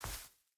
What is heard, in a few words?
footstep barefoot grass jump land; Rust